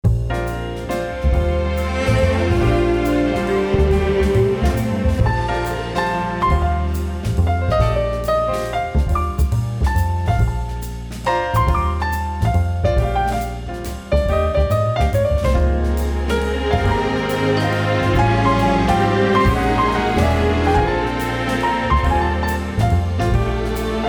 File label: Istanbul Loop, Acoustic